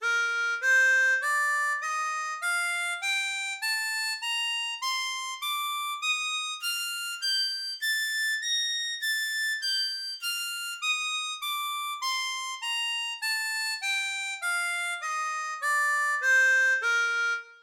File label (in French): Si B Majeur, Gammes, harmonica